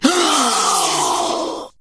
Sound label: Wound Crit; Ionar